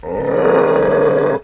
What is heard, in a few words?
Dog Growl; Playable Tutorial Level; TR2